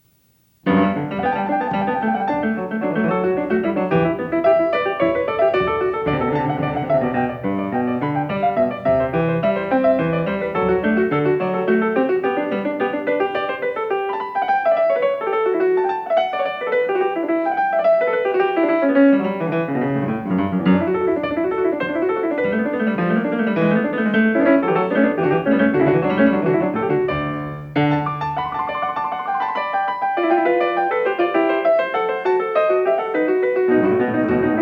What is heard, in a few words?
Variation 1 a 1 Clav., PIANISTS